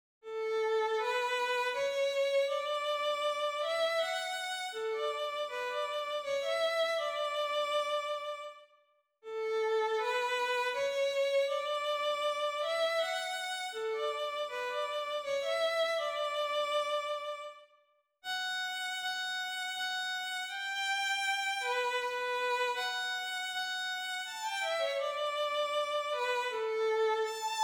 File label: violin; ROBIN ADAIR